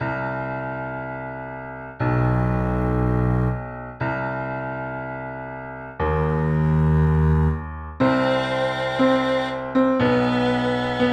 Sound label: evil steps up